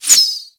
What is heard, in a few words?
Arrow Whizzby; Warframe; Bullet Impacts; Whizzbys